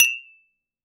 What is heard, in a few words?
Glass Cling